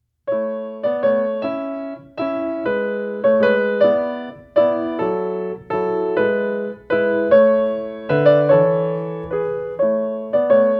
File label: Andante Grazioso Mozart pianist